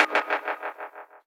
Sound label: Percussion